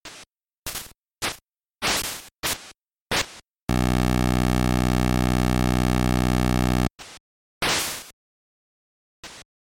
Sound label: sound effects; felix the cat; nintendo